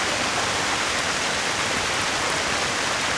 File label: falls; ambience; yavin; lionfalls